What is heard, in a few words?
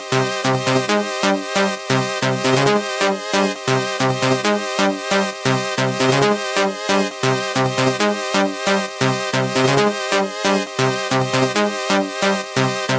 Game Music